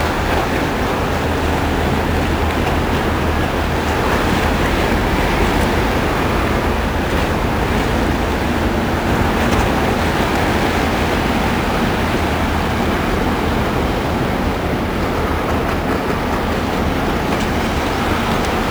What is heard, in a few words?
ENV EXT Sea breathing